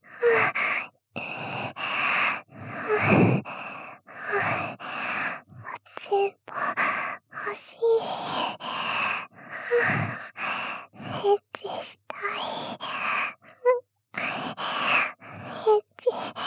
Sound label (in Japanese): エッチしよ 喘ぎ